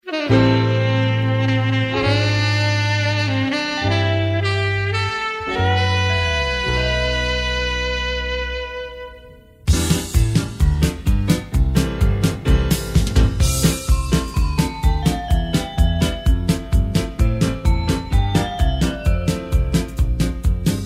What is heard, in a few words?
music